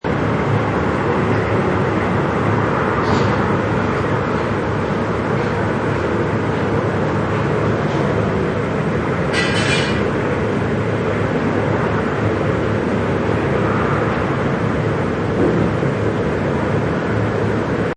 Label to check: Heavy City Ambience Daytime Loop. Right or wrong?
Right